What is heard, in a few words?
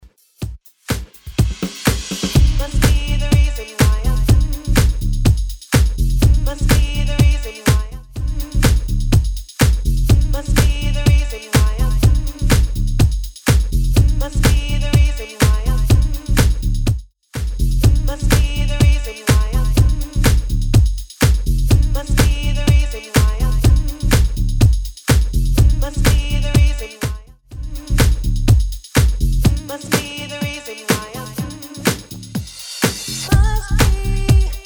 Club Mix 124bpm